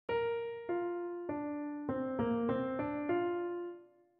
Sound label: Continue the melody example